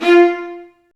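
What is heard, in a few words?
Marcato, Strings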